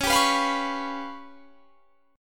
Db 7th Flat 5th; 21,22,21,22,24,21; Db7b5 strummed

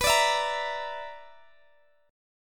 B-Minor Major 7th Double Flat 5th, Guitar Open D

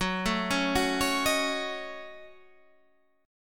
A 6th Flat 5th F sharp, chord

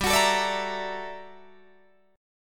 G Minor Major 13th, G, Guitar, DADGAD

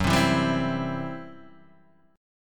C Major F sharp

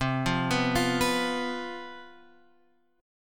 C Major 7th Suspended 4th, down

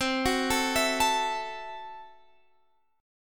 Gb Minor 7th Flat 5th, Guitar

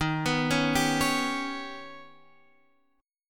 Eb Augmented 7th Eb down Guitar Half Step Down

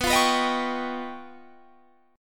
Db 9th Flat 5th B

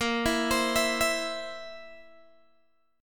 Bb Suspended 2nd Flat 5th, Guitar, DADGAD; arpeggiated; 20,19,22,21,19,x

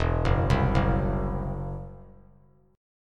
E Suspended 2nd Sharp 5th, Esus2#5 chord; arpeggiated

